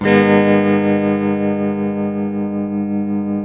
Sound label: TELE CHORDS MIN A, Guitars; Spectrasonics; Hans Zimmer Guitars VOL-1